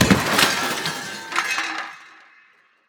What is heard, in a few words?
Explosion Debris; Weapons